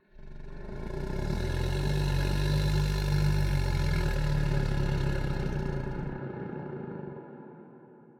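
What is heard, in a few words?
ambience bad creature moan; smite; conquest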